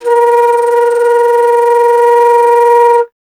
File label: Alto Flute